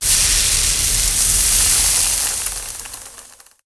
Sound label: ice pneu; Quake 4 Alpha; id software